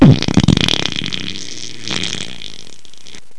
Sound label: thunder